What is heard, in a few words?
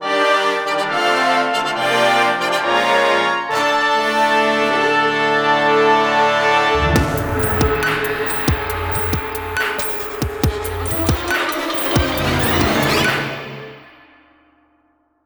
Coin Battle Intro; Metroid Blast; Nintendo Land